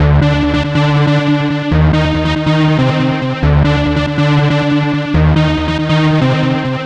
NRG Pad; Sonic Foundry (Sony Creative Software); Trance NRG VOL-1

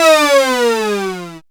synth e, Dance Synths, Juno; ProSamples vol.37; Juno Jam; Best Service

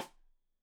Side Stick Stereo; Deeply Sampled Drums; SMDrum

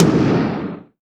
T-TOM, PERC; NorthStar - The Wizard VOL-2; TSAR PERC

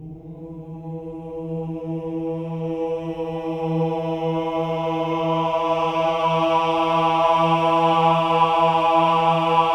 OH-AH E3 R, CHOIR; Spectrasonics; Symphony of Voices

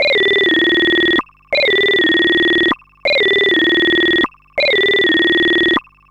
Ring Mod loop; radiosonics

